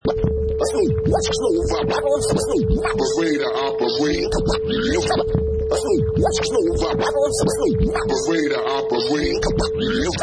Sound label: Vinyl Scratch In Comes the Bass 95 bpm with beat